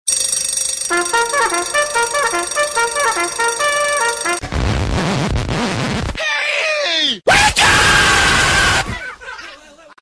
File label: Reveille